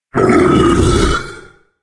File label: death, player